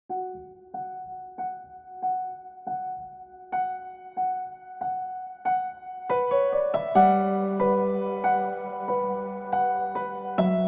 Rain - Nostalgic and Sad Piano Background Music For Videos